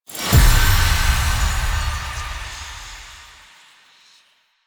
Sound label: Choice Menu Open; Warframe; Jade Shadows; Quests; Ending Diorama